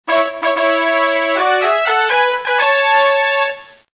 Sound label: win